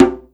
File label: djembe, Yamaha Motif ES drum machine; 300 Drum Machines; Yamaha Motif ES-Series